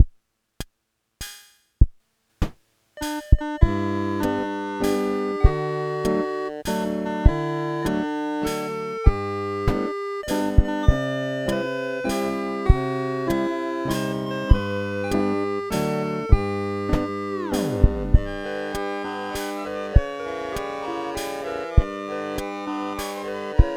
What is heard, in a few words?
casio happy birth